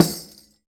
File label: Tamorra, Roland BK-5 Percussion